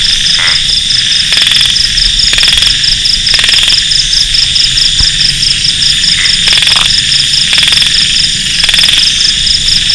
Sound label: Microhyla heymonsi